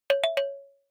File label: transcription done; MindWork AI Studio